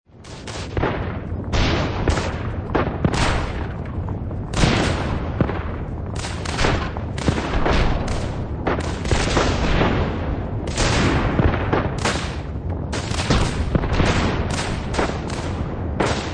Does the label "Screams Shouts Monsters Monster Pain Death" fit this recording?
No